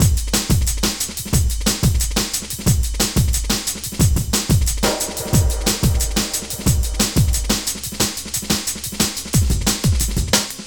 Cool Beat, Drum & Bass; Fluid Dynamics

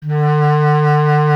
B. Clarinet

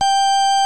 60's Organ

